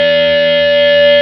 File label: FBACK E1, Classic Guitars; E-MU Formula 4000 Series Vol. 3; Analog Odyssey